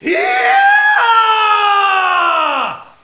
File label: comp attack; Empire Earth